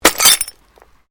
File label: GLASS SHARD SMASH; Material components for constructing more complex sounds